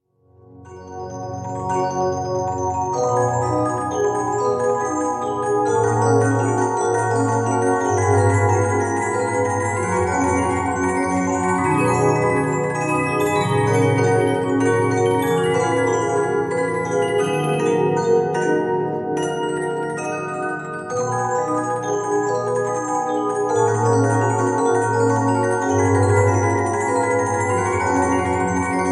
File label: Acceleration Waltz, music box